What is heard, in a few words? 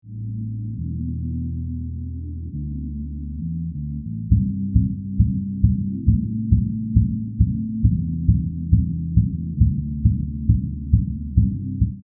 run bass